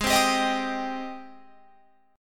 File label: Ab Major 7th Ab down Guitar Open F; AbM7 strummed